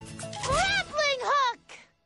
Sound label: Grappling hook